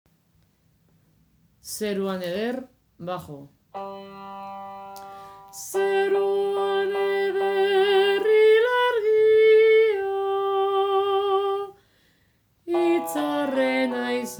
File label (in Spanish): Zeruan Eder Ilargia Bajo